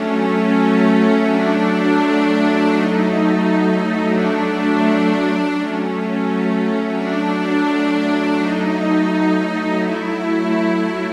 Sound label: Strings, Trip-Hop Loops; Sonic Foundry (Sony Creative Software); Rondo Brothers